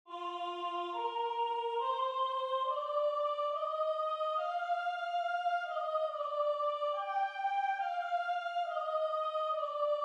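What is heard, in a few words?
BWV127 Soprano; Bach; Weinen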